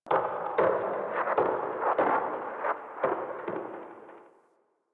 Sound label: Landscape Glacier Ice Distant Medium, Ambience; Warframe; Venus Open World; One Offs